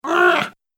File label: Goblin Straining, monster; horror